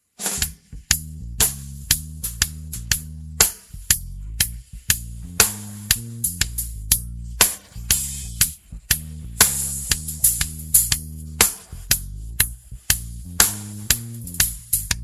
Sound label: Modern World live (Hihats)